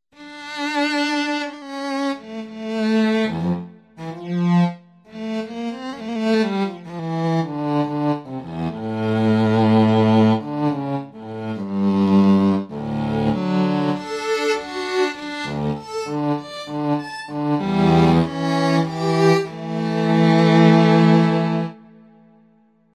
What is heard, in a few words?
cello